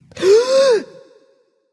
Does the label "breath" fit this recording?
Yes